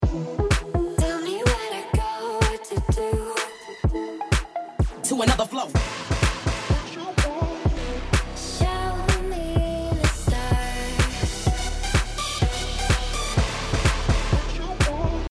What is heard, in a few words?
start round, music